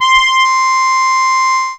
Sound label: Harp 2 C5, Fairlight CMI IIe